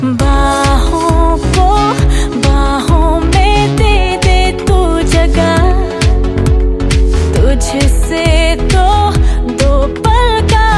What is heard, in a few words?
Romantic Hindi Song Ringtone